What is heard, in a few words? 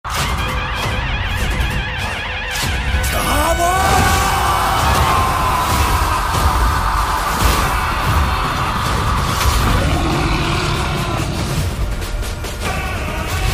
BGM; Chhaava